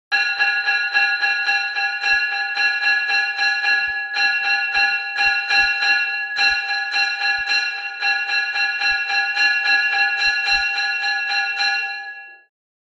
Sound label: Alarm Bell Sounds